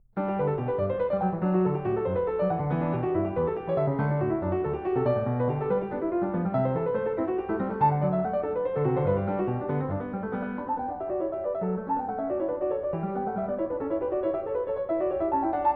Goldberg Variations, BWV 988 Variation 8 a 2 Clav. (Binaural); Classical; Bach